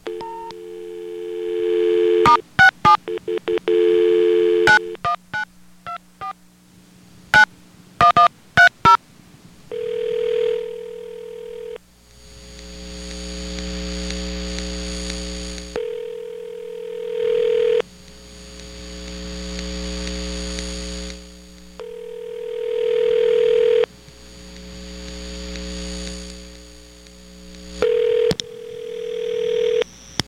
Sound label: ring; Ring Out; this number used to be a Time&Temp for Apex, NC